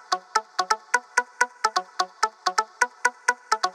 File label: Special Sounds Root B 128 BPM; Vengeance Samples; VEH3 Special Sounds